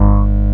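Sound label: SUSTAIN BASS G3, SYNTHS; OBMX-SYNTHS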